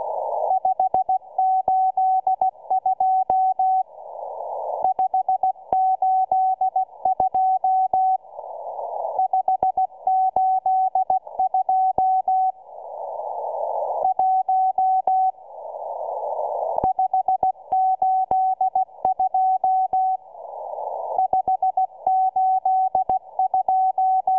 morse

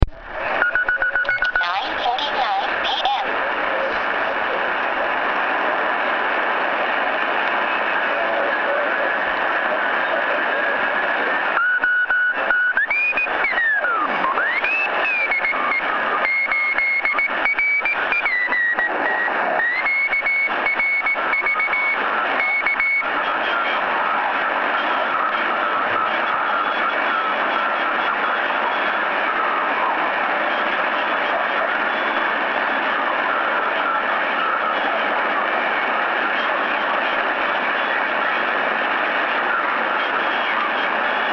Unid time; Unid on 3690 kHz; N&O 162

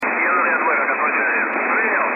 4350 kHz, rus mil cw; Russian Military; 4350 kHz. 2012-02-22, KEDR

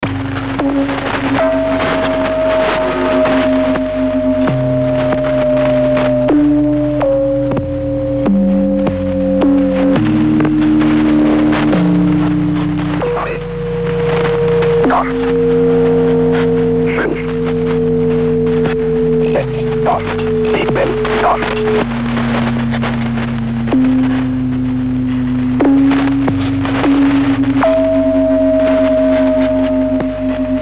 6875 khz pirate; Pirate numbers station on 6875 kHz

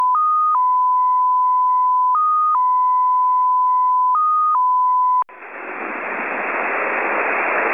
error

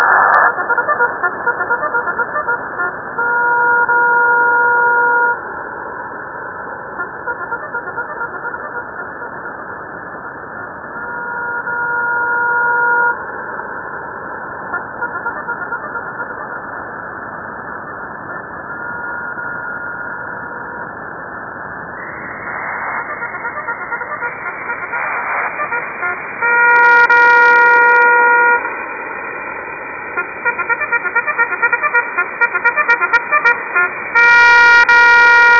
Bugle loop; Bugle + PSK-8, 2021-01-14, 0944 UTC, 13461 kHz

